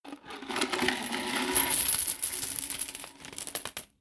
Coins

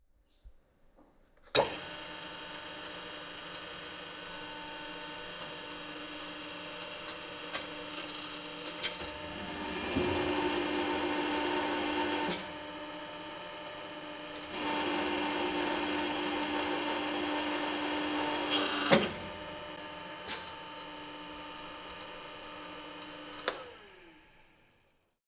Hydraulic Press

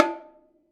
Bongos

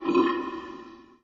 Growl

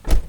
Car door close

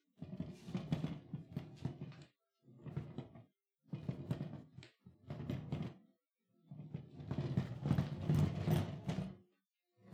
Wheel Bag Rolling Over Tiles

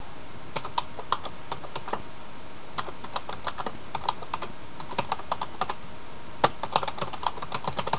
Typing

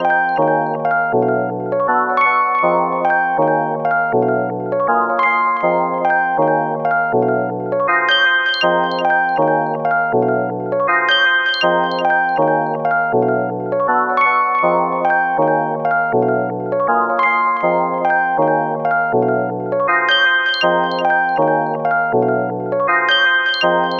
Gentle jazz 80bpm C Major

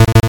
Cancel

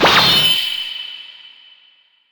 Anime Sword

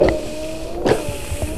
Datsun

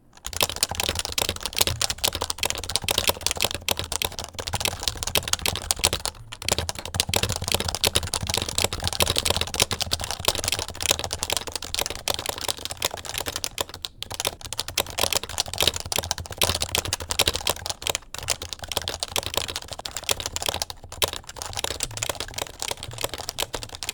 Keyboard Typing HHKB Topre

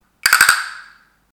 Castanet

